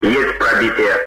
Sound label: kill grenade; cstrike; ctf